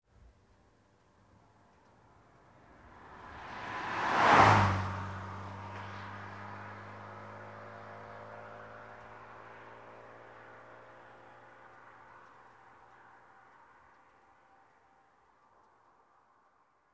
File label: Car driving by fast